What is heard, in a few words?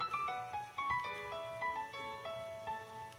nokia 2610 ringtone nokia tune